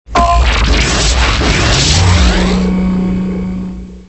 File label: rings